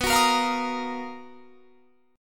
B Major Sharp 11th

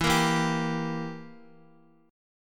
E Diminished down Guitar; strummed; JGuitar

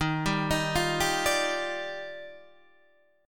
Eb Major 9th, Guitar DADGAD

